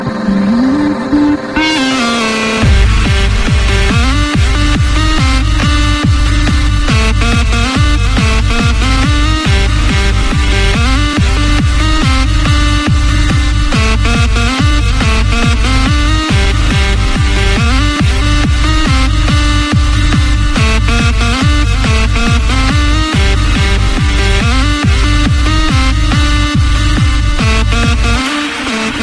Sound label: Trance Hardstyle